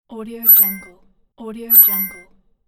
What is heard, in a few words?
swoosh bell